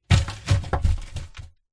horror coffin mud dirt small stones hitting coffin box being burried internal; internal coffin pov